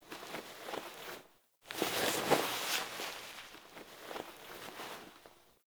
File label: Stand Foley; Chimera; Operator; Liset